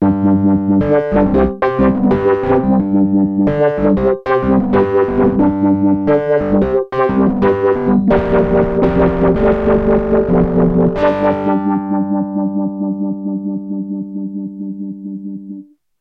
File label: Elektron Analog Heat, Rough Crunch Filter Pan Rhodes